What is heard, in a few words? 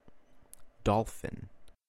Dolphin